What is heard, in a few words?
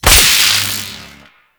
Beam Hit Effect; Enemies; Warframe; Captain Vor